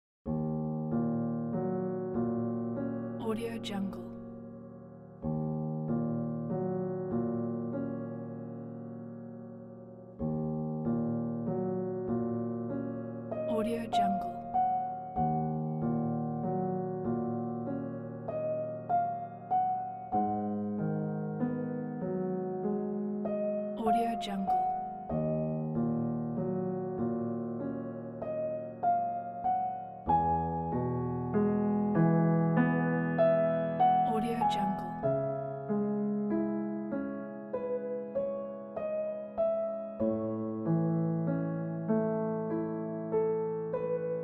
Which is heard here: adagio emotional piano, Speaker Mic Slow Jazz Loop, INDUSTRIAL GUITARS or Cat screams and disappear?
adagio emotional piano